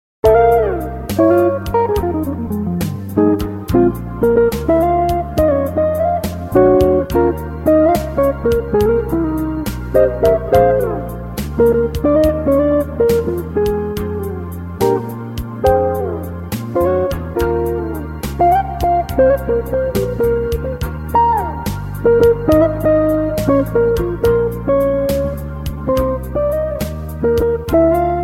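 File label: kitchen octaves, guitarist, jazz; octave chorus; solo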